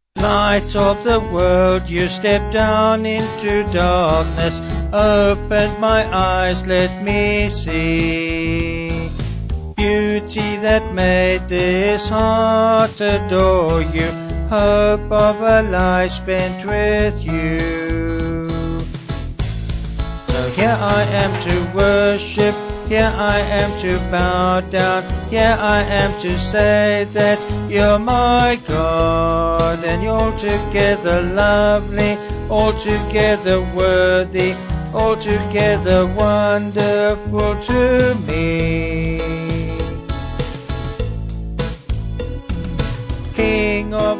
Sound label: Vocals Band